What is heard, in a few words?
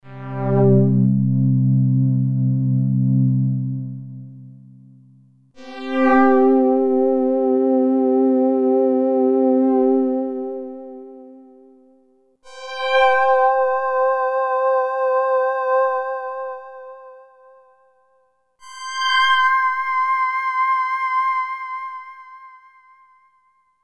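Soundtrack no reverb, Roland D-50; Patch analysis; 37 Soundtrack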